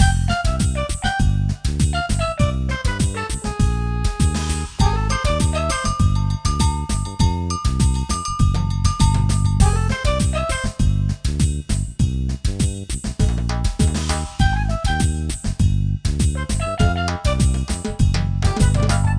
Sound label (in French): musik nuit